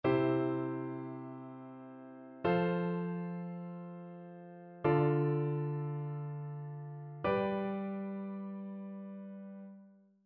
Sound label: harmonic dictation; aural skills i